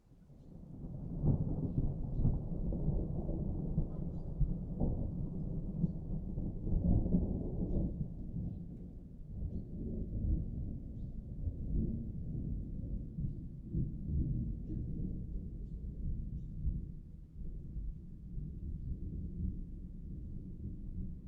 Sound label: thunderstorm summer morning distant rumble sparse approaching; Pole Position; Thunder & Rain